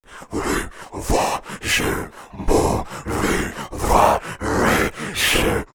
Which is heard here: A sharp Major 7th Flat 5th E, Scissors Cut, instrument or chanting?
chanting